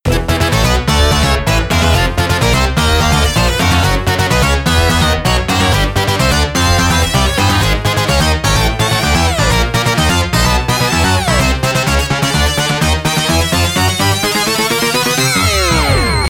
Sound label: Multiball Restart, pinball